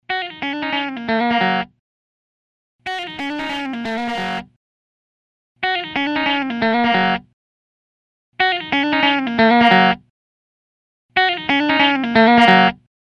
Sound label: Attack; Chick’n pickin’ lick; Compressors